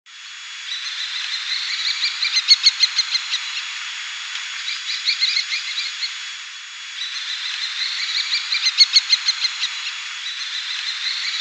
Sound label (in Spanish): Cernicalo Comun